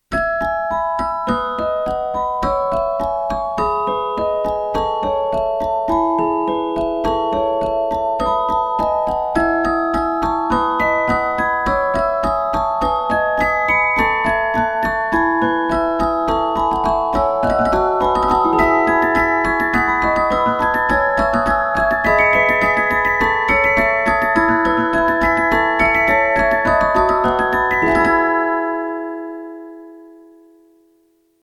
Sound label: canon